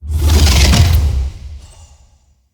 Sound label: stag blinding light cast; Powers; Stag Pact